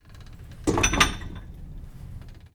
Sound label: weightlifting machine; prosoundfx